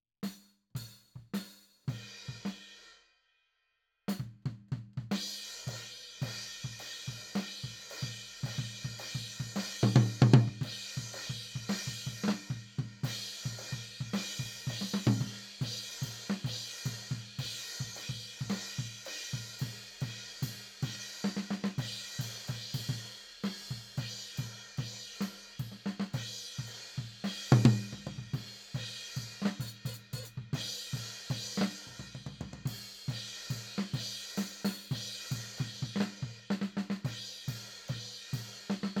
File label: Tom